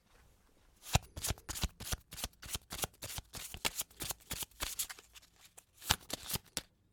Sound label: card shuffle; wizard